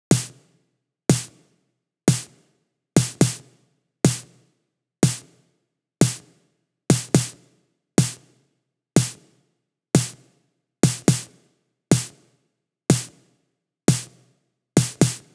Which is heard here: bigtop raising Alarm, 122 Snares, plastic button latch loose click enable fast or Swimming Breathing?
122 Snares